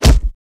Body Hit Punch Thud; punches kicks hits bones

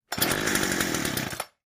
chainsaw stall

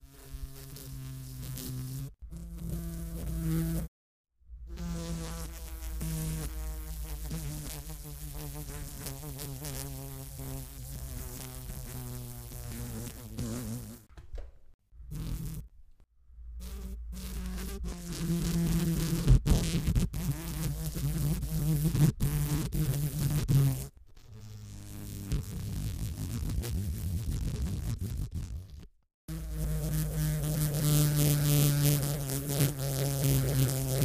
Insect wings medium bug reproduction

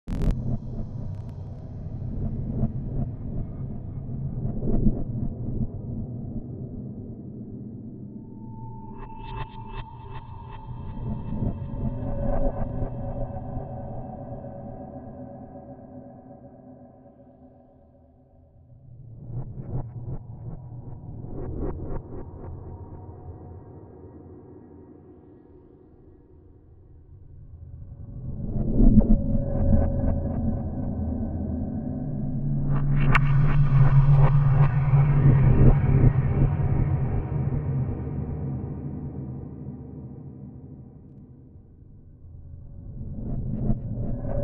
Gravity slow, distant, sustained rumbles

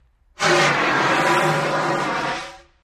Extinguisher Blasts; fire extinguisher

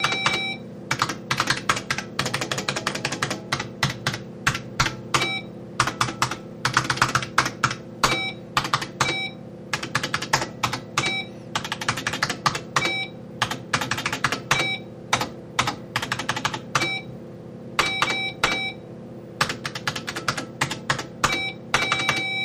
Computer Keyboard T, typing

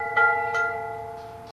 Bell Buoy Ring

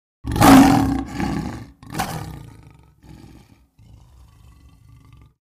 Lion Roar Snarl Growl; big cats

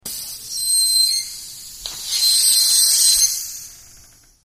Air Release Valve

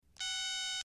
Telephone Cell Phone

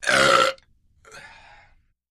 Belch Sigh; belching

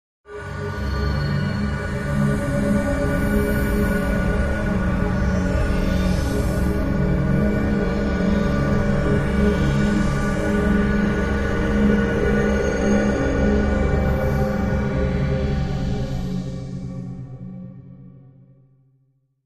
Bed Haunting Cacopho

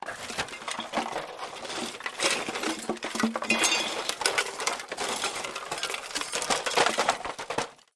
Junk Debris Spill

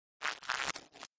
Insect Buzz Shrt, wings

